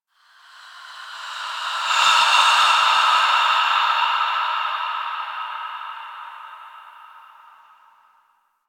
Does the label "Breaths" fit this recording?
Yes